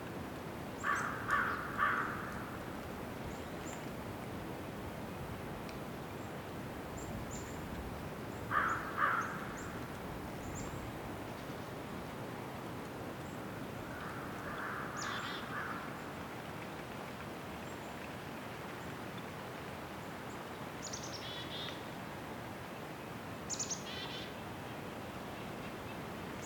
WINTER Forest afternoon slight breeze with sparse crow and birds; North Laurentian Woods